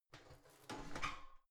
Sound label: garbage can open lid squeak